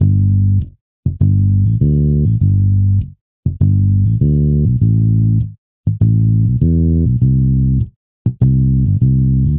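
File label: Bass Pop G major 100bpm; Guitar and Bass; Ableton; Factory Packs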